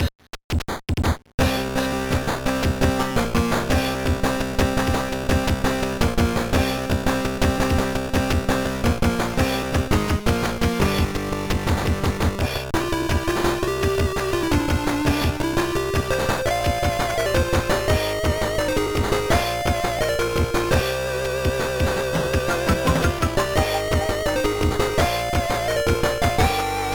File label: BGM; super street fighter ii turbo revival; gba